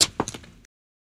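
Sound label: Footsteps On Wood Floor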